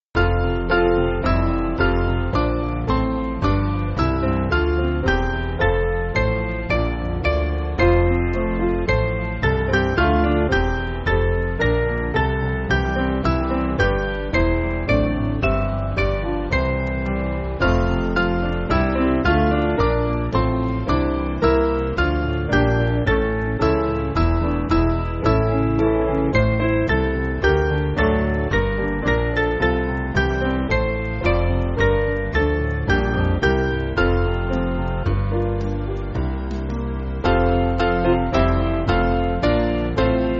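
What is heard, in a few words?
Piano, church backing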